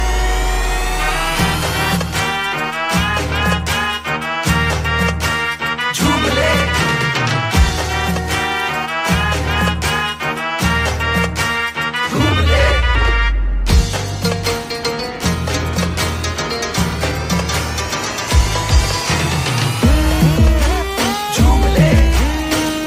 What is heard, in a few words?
music bollywood ringtone